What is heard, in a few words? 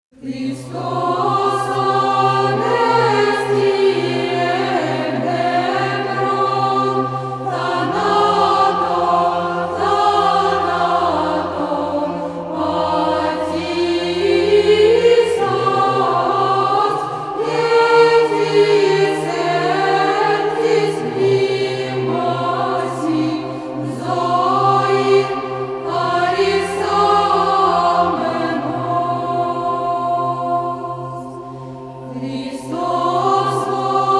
zhenski choir